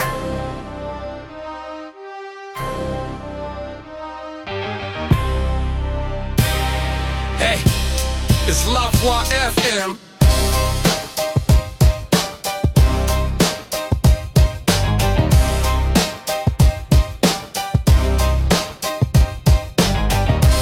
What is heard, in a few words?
HipHop, Extended musical segment that shows how the style can hold a longer branded read; 60s cut; Music + voiceover demo